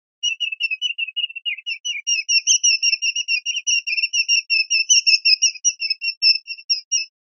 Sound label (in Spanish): rep bailoteos, pardillo